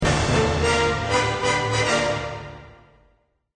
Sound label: Minigame Success; Doctor Who; Adventure Games; Episode 5